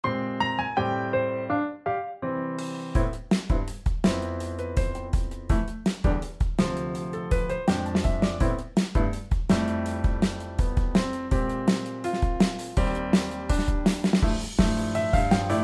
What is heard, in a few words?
drum intro